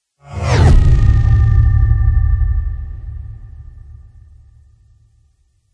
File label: spaceship fly by; george gazetis